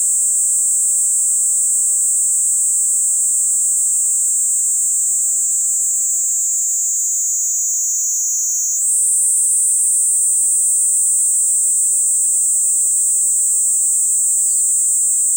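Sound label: kaempfer cicada; niiniizemi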